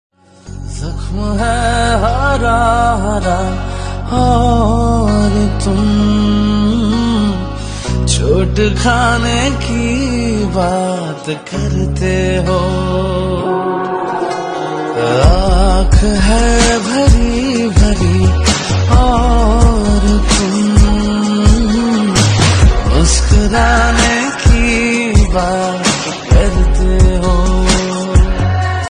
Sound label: sad emotional ringtone